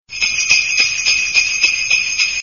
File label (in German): Schlittenglocken